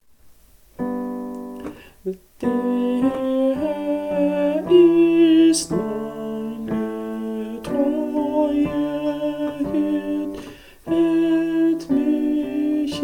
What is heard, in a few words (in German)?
Tenor, Kirchgemeinde; Bach